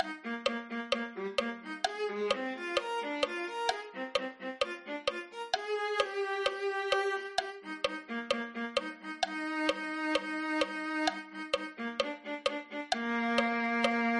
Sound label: viola